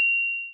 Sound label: 8bit kit coin; LO FI